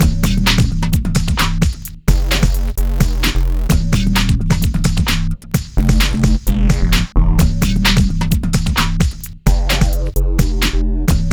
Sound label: Hardcore Breakbeat, 130 Bbmin; Big Fish Audio; Hardcore Breakbeat Action